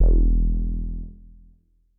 M Elec Bass E1; KG MegaSaur

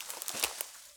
Rustly Step, Footsteps, outdoor

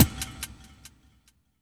END, Tekno Industrial; East West

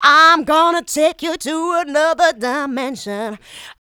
Female Vocals; Houseworx

